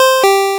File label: pingpong